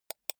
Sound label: computer mouse wheel click soft